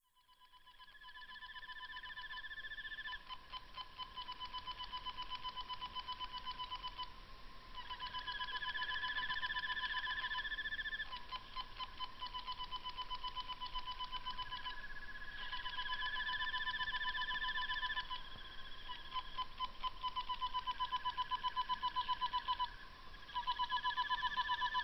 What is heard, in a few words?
crickets Paparoa triple slow mix